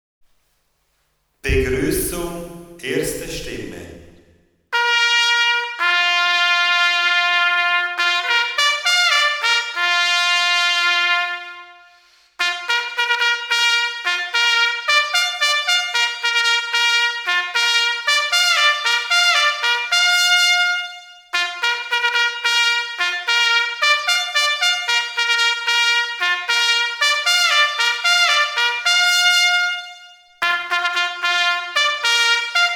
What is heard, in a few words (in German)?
Begruessung, Jagdhorn